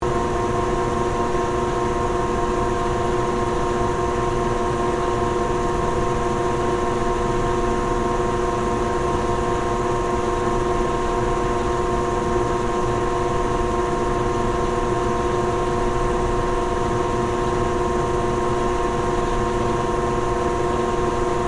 Fan Motor Run, Industrial, Westinghouse, Central, Fan, Loop; Machinery; Blast Wave